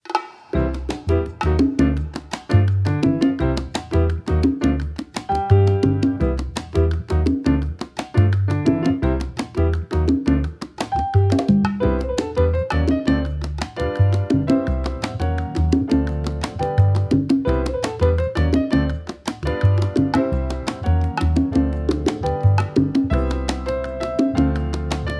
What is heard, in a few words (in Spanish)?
salseando